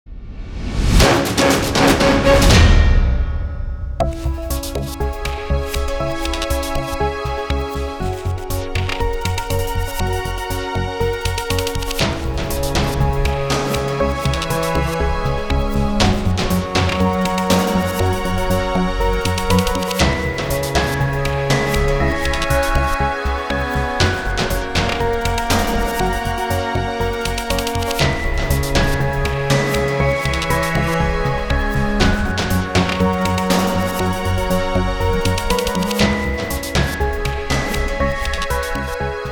Firing up Victory; starcrawlers original soundtrack

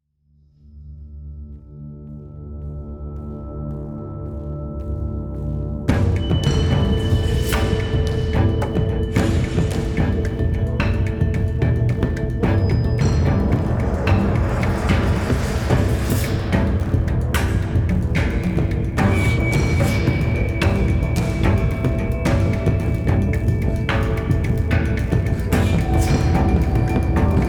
Action loop

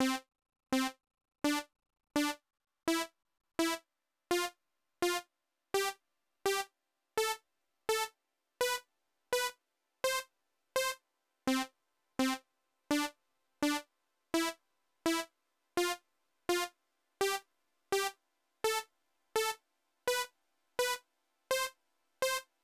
Sound Scale Check

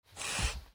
From Coffin

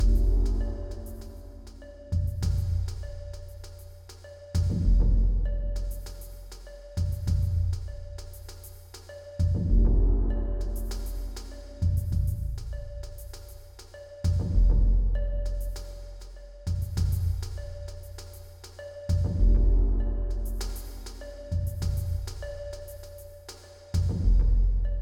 Explore Tension Drums Submix; Necropolis

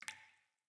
Cave Random Drips; The Inn

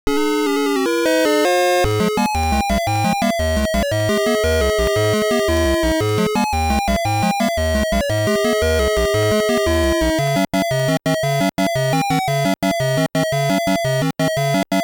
~Bataille~ (Encounter ~ Battle ~ Victory)

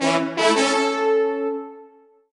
Fan Horn; Kirby's Extra Epic Yarn

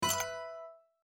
Chip Destroying; My Cute Commissar

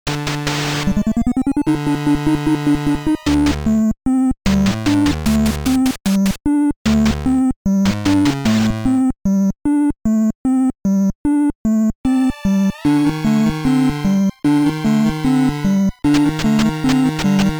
Cave Theme; just breed nes; Monster Base

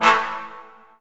targethit, pinball; Excalibur; zen pinball 3d

